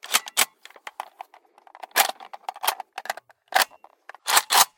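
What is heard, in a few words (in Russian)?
сборка и заряд автомата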